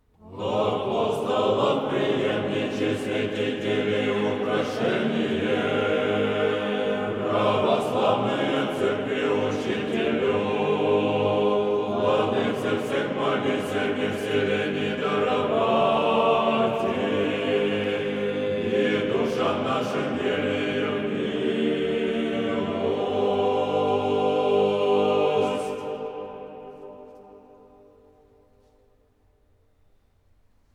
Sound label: Kondak glas 8